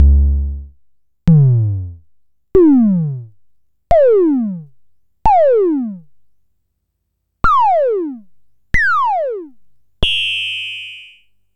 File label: Coron Drum-Synce DS-7